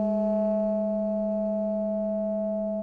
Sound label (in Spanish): Multiarmonicos